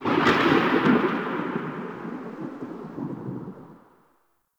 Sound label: Cloud Thunder; Warframe; Monkey King